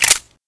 mac10 clip in, weapons

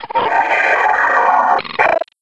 zombine idle; scmod

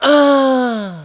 say aaa

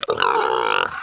pig attack

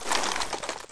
saw draw

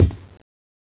metal

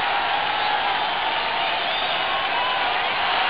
crowd

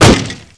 barrel wood falls down, debris

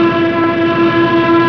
prop fast

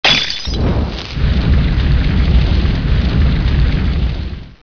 molotov explode

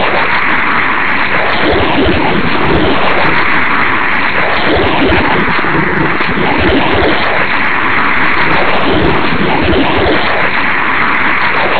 slaves loop

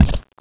walk

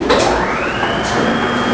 freight lift start

